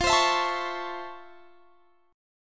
F Major 7th Flat 6th, F, guitar

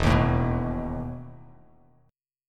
E Major 7th Suspended 4th Bass Standard; strummed; JGuitar

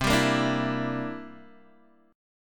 C 9th, Guitar DADGAD, chord

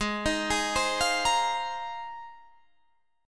G sharp 6th Add 9th, Guitar, Open D

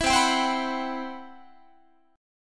C Major Sharp 11th, Guitar; CM#11 strummed

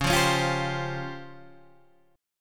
Db Major Sharp 11th, Guitar, Drop D; DbM#11; strummed; 11,8,11,10,8,8

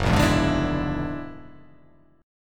F sharp Augmented 9th; 2,1,2,1,2,3,4,1

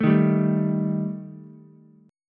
G Suspended 2nd Sharp 5th G Ukulele; Gsus2#5 strummed; JGuitar

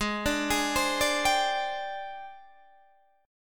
Ab Major 7th Flat 5th Ab, down, Guitar, Standard; arpeggiated; JGuitar

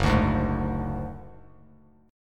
F sharp Suspended 2nd Sharp 5th, Bass Standard; strummed; JGuitar

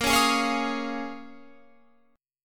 A sharp Minor 11th, Guitar, Open F; strummed

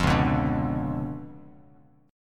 C sharp 7th Flat 9th Bass Standard, chord; C#7b9; strummed; JGuitar

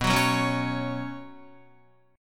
B Suspended 2nd, Guitar; Bsus2 strummed; 6,9,6,6,6,9; 4F,4A,5C,5G,5C,6E; JGuitar

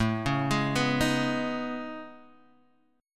A Major Sharp 11th, Guitar, Open G

